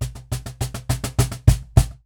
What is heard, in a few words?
Cajon Fill; musicradar